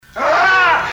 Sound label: pain